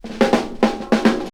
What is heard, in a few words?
tick tock baby fill, drum loops